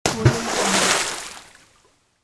water splash

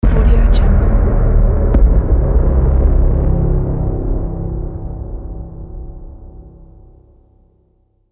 horror ident

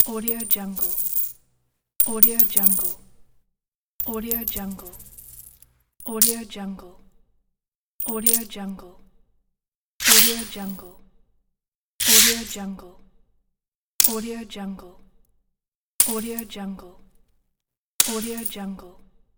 coins

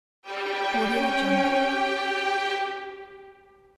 strings notification error; soundrose

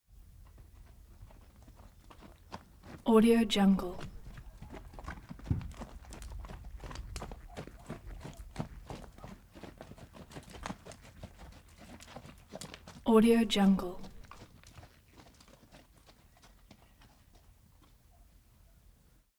two horses trotting by slowing down grunting

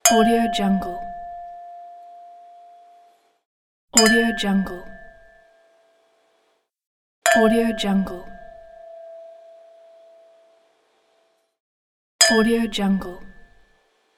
wine glasses cheers

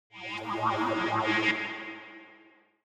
musical synthesizer scifi loading

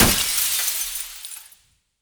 explo glass; matter mayhem; soundmorph

